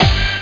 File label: Collect